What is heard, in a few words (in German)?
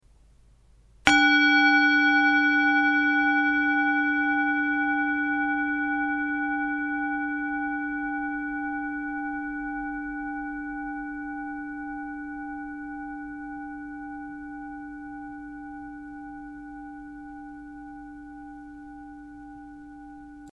Klangschale